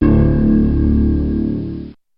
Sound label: uno piano note